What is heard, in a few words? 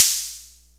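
percussion; ZERO-G; Datafile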